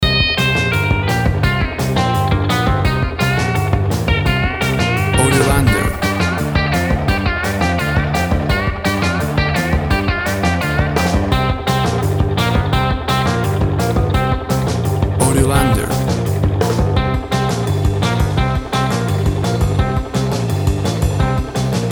Upbeat 60s Surf Instrumental Rock

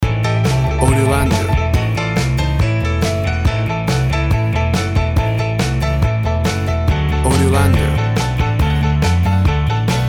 Working Mans Country Rock and Roll Boogie

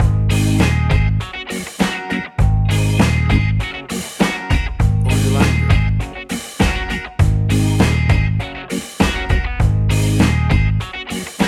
Funky Groovy Reggae Ska Music

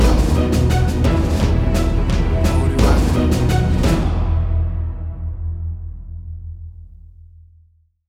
Big Scary Action Trailer Strings Stinger; AUDIOLANDER

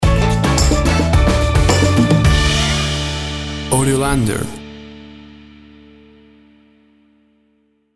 Upbeat Uptempo Calypso Summer Music; Stinger

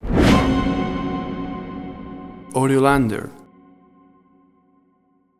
Music Hit

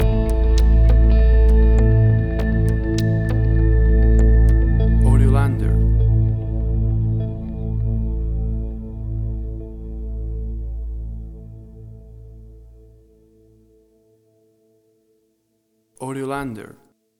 Ambient Electric Guitar Stinger

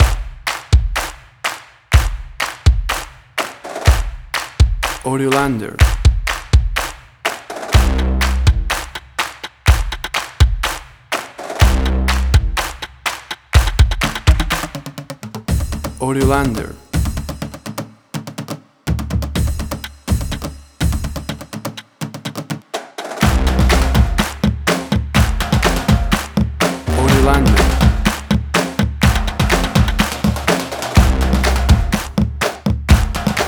Drumcore

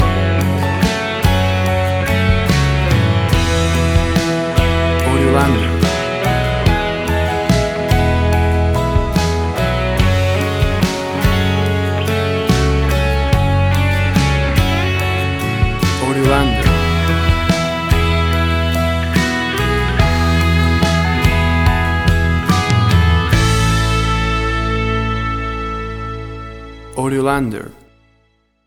Slow Acoustic Country Romance Ballad

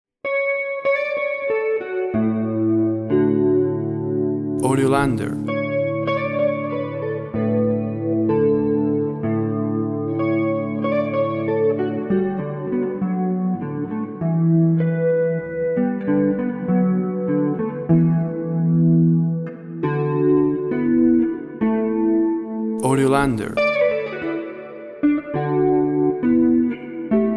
Windfields

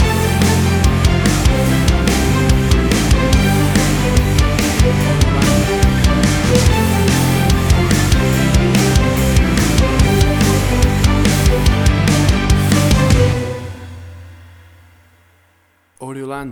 Big Stadium Classical Rock Crossover

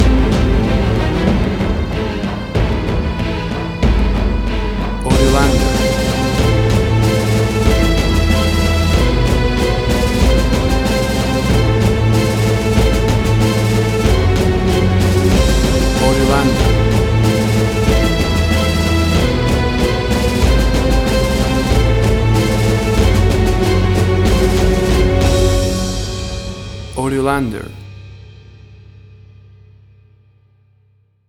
Big Action Trailer Music

